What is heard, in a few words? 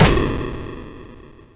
sid 8580